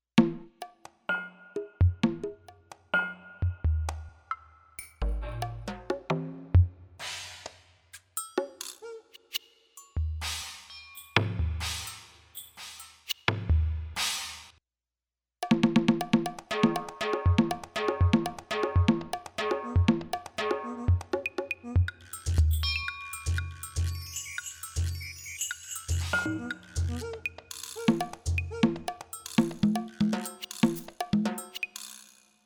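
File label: Drum ETHNI KIT, synth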